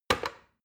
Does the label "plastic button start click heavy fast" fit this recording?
Yes